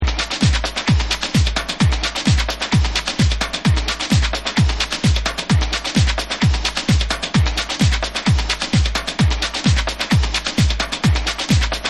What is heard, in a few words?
Bonus Beats